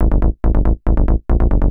TECHNO BASS 140 A

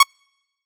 Glitch, One Shots, Glitch FX

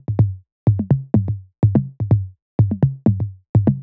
125 Grooves, Percussion; Touch Loops; ElementsOne; AbstractRhythms

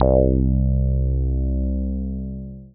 BASS MONO

